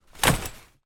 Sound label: household camping chair in canvas cover throw on top of 2 others